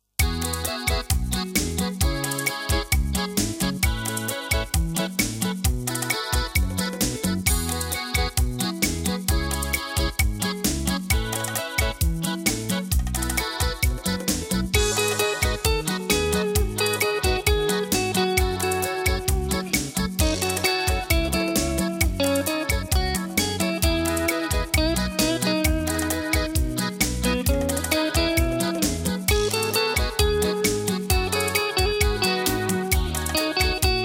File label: instrumental